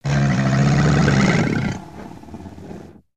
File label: Pet Crocodile Howl; creatures pets